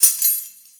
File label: Pickup Key; Pickups